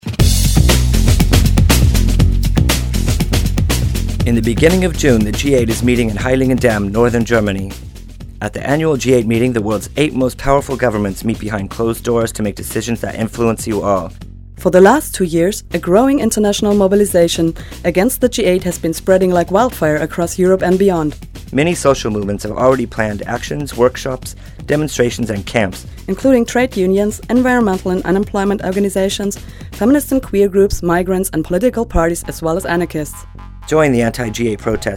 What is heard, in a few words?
Jingle; Anti G8; Heiligendamm 2007